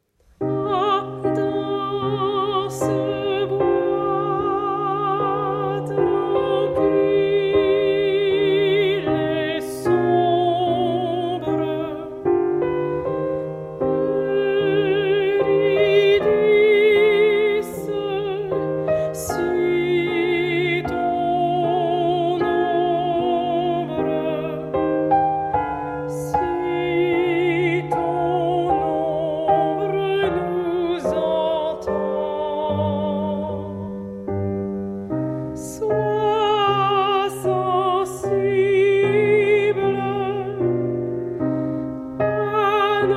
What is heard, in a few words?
alto; gluck; ah dans ce bois tranquille